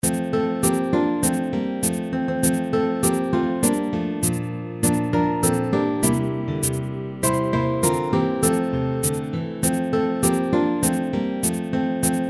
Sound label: Jingle Bells